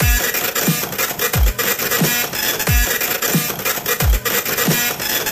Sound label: Printer Noise music